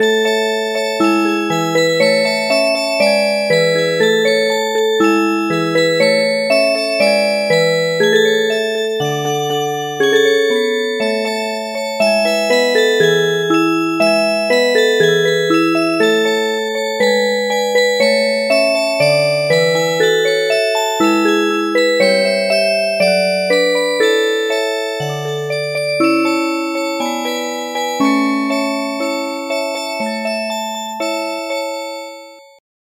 Music Box

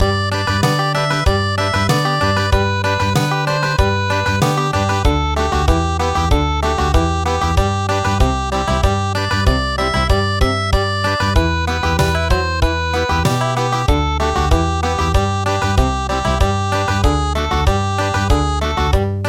Country; Long I gaze across the snow

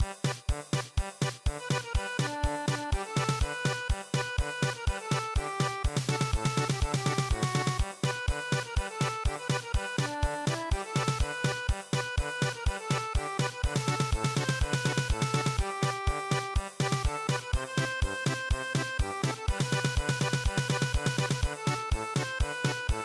German Polka; Down By The River Side

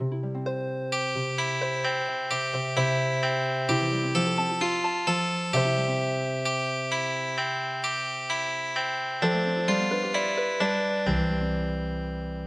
Healing Harp; Good Morning To You

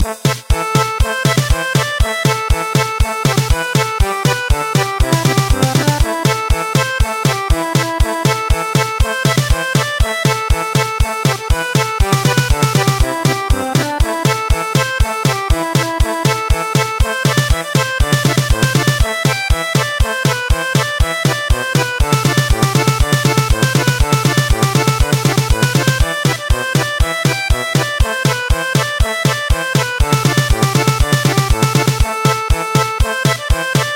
German Polka

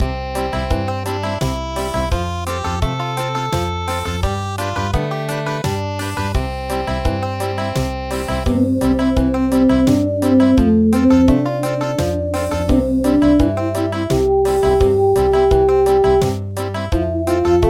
Country; Clock Tower Bell

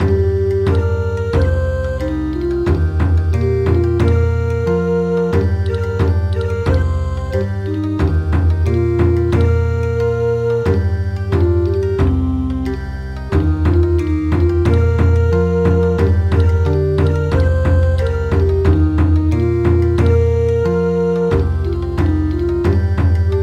Bon Odori; Thai lullaby

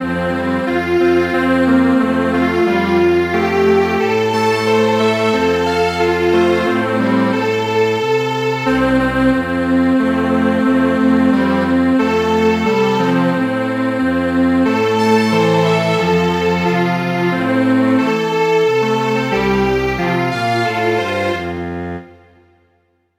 Strings Quartet; A duck

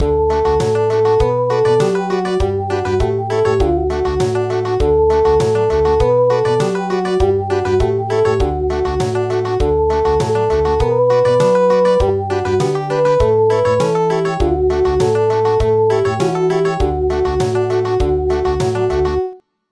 Country; Many Years

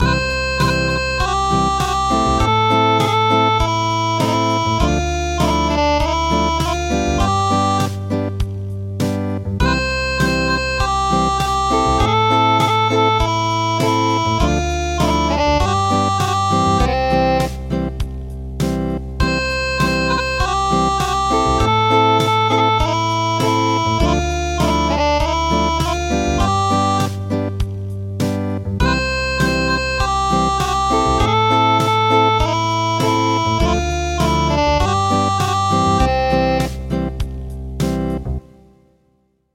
Acoustic; Bunny

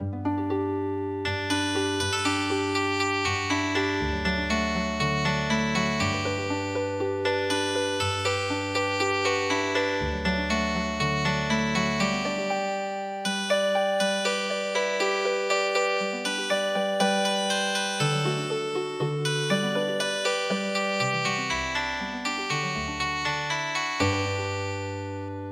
Healing Harp

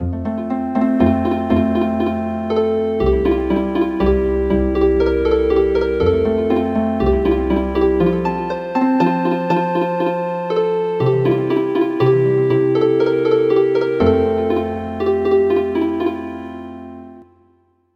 Healin Harp; Morning tide

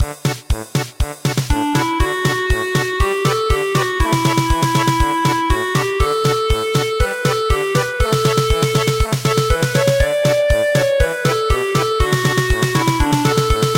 German Polka; Lullaby

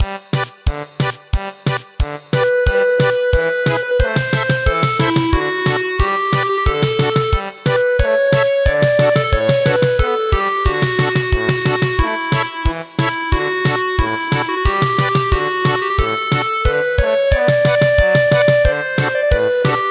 German Polka

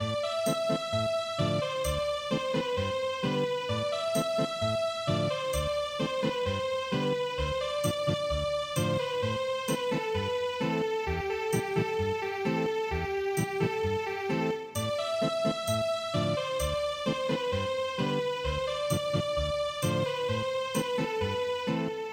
Ethnic Guitar

